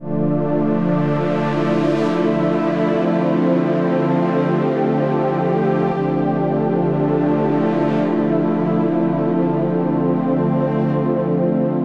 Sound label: Ceremonial Pads - Dmin 81 bpm; MIDI Clips; Tonal; Atmospheric; Ableton; Factory Packs; Mood Reel